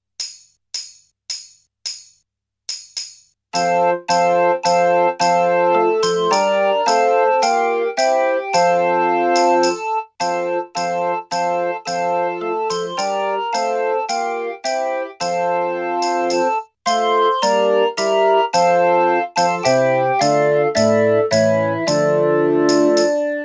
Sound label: Galliard, 108